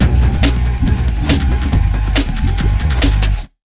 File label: Big Beat Drumloops, 140